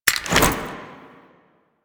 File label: Invisibility Throw; Warframe; Quests; The New War; Drifter; Abilities